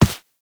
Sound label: Run Default, Footsteps; Warframe; Characters; Operator